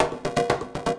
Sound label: Drivin, Percussion Loops; Drums